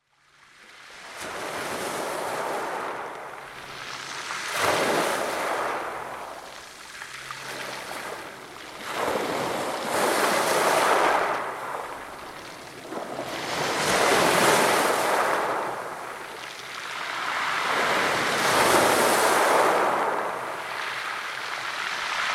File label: pebble beach, ocean